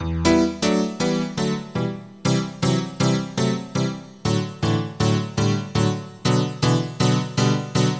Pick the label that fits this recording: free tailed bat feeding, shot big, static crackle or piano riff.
piano riff